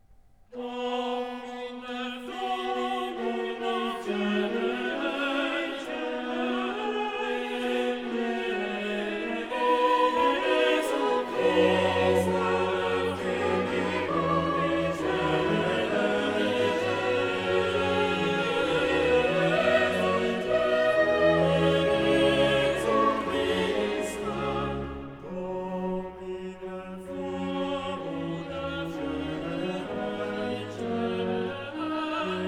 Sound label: coro